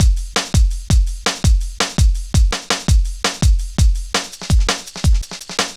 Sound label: Drum Loops, Jungle, 166.5; Zero-G Jungle Warfare 1, 2 & 3; Breeze; 90 s Sample CDs